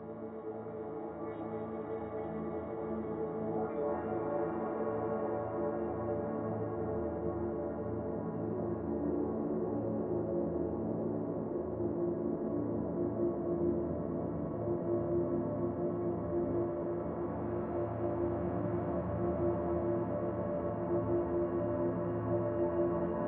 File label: dark ambient music loop mystical; gamerip; Hellpoint